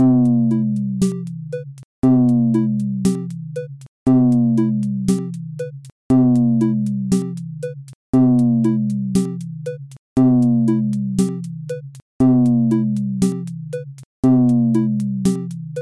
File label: beat; ohrid